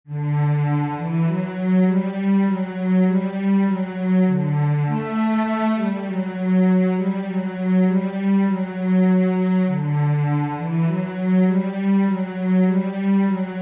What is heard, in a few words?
Deck the Hall SAB; lyd partitur